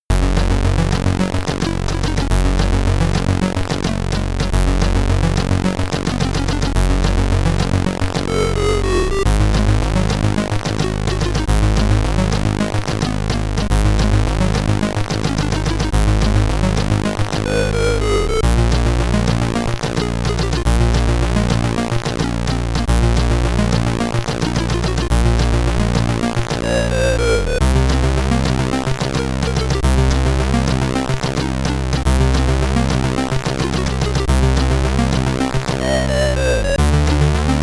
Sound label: SID Stereo; Lions of the Universe; commodore 64